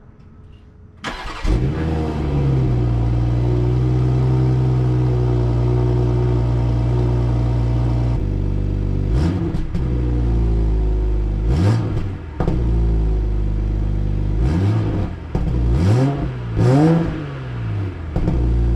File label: bmw x5 40i g05